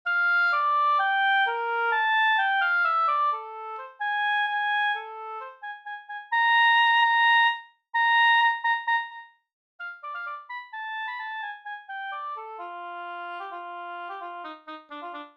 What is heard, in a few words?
Prickly Oboe